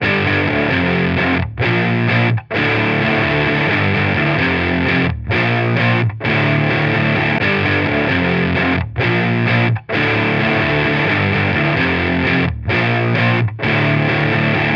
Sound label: Guitar